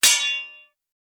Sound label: sword tsing